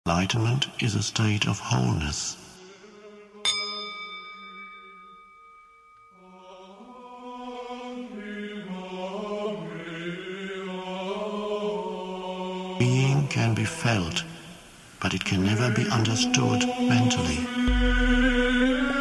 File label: Trance Zen Dance